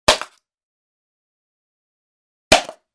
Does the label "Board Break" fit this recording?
Yes